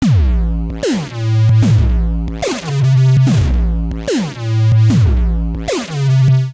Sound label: Drop D Minor 147 bpm, Bassline; MIDI Clips; Tonal; Boulder; Skitter and Step; Ableton Factory Packs